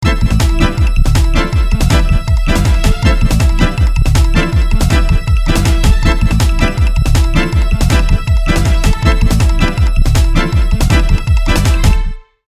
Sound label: Intense Transition Hi